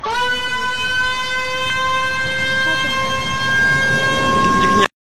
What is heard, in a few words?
avion stuka